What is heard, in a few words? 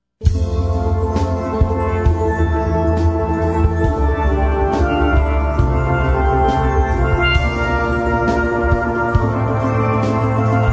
steel pan sax